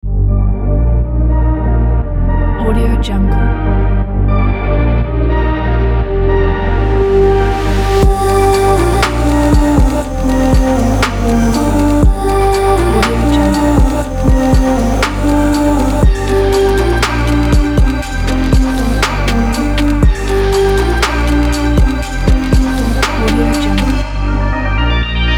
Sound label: future abstraction story